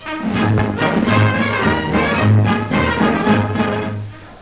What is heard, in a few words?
newsreel band loop; Commercials and Radio